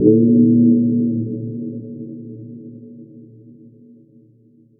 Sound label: houaaaaaaaaaam; Truth-Hz - 1-Hit KO Sample Pack